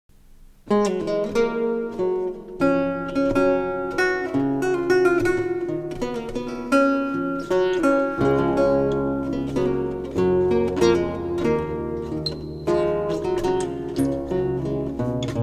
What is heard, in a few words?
Courante